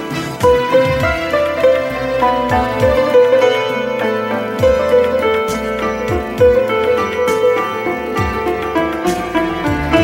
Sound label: Santoor